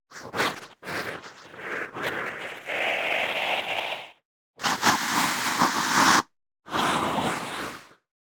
Aggressive Zombie, Monster Zombie Vocal; Coll Anderson

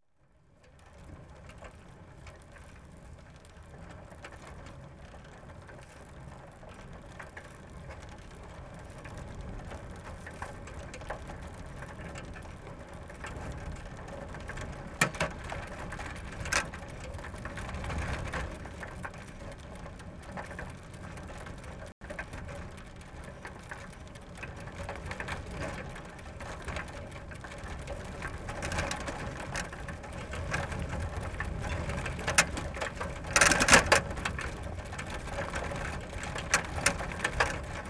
Bicycles, Fast Continuous Run On; BBC Sound Effects Library

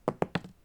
dice Throw, Casino; Kenney's Sound Pack

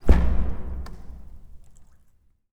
Wooden Door Slam; 99Sounds; The Warehouse

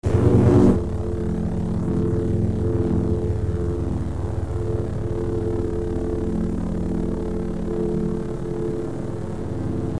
Double Bladed Saber Sound; FXHome

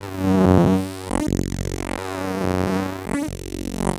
Bass drone F#, Circuitbend; Drones - Bass; 99Sounds; Mangling Audio

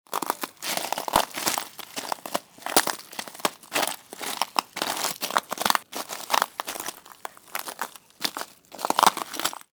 Stepping on ice; Kevin Durr; Ice cubes & Ice Blocks; GDC 2016 - Game Audio Bundle

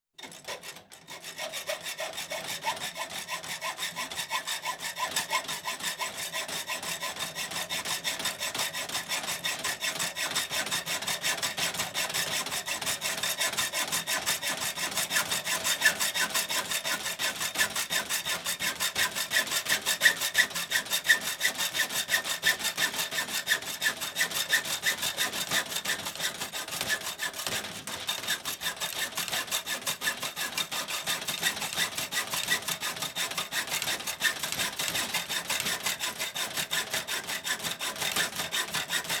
Hacksaw, Piece Of Metal; Construction; BBC Sound Effects Library

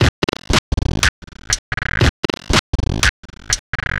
Loop, 120 bpm, Stutter Sounds; 99Sounds; Mangling Audio

